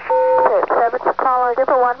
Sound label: telephon